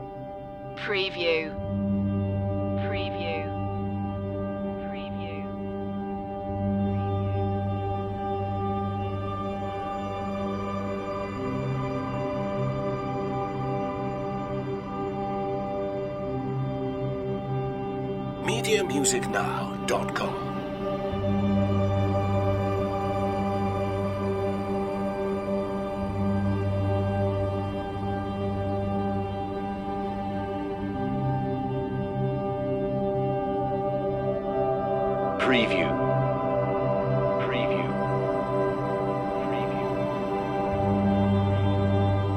chromatic waves loop

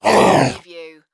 Monster Growl Snarl

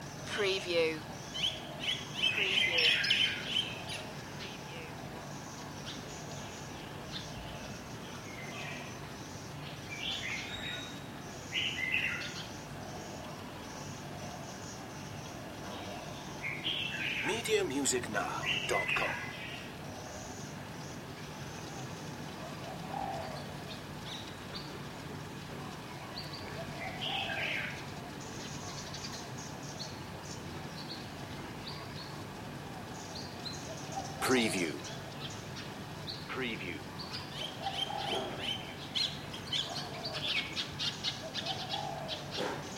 thai town morning; the sound pack tree

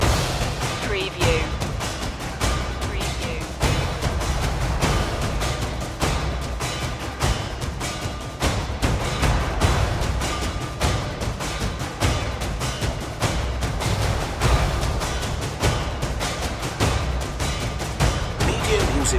epic hymn perc loop

